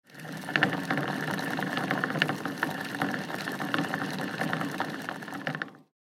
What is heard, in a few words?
Hamster spinning on a wheel close perspective